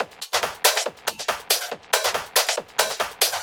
Loops 140 BPM Hihat Percussion Loops

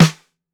SWUNG SNARE; Basement drums vol 2

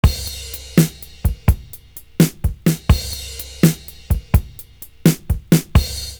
love sticks, loop

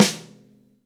V-Pop Snare, Roland BK-5; Snares

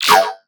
synth hit yoink layer; Virtual Riot

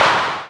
Claps; Vengeance - Essential Clubsounds Vol.5

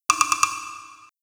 Hi Wood Block Pattern; Beat Components

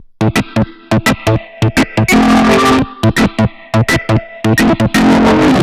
Organ, 85bpm, A1, analogue circuit; musicradar

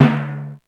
Timpani, EMU E-Drum

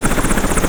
ROTO BLADES, 3-D Audio; E-MU Producer Series Vol. 5; 3-D Audio Collection; 3D Multis; 3D Sprint Select 2

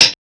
Hats, Alesis Performance-Pad

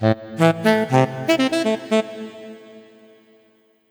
118 Am Sax Riff Thing, Melodic Loops; Electronisounds

